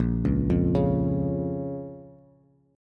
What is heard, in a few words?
A sharp Minor A sharp down Bass; arpeggiated; JGuitar